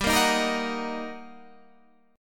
G Minor Major 7th Sharp 5th, G, down, Guitar, Standard; GmM7#5 strummed; JGuitar